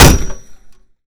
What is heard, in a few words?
Chair Hit, Metal Chair; zps; Weapons; Melee